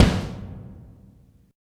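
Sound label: RING KICK; East West - Phat & Phunky CD2; 90's Sample CDs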